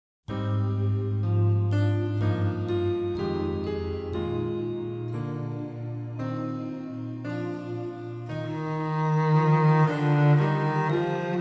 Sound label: Ambience Cello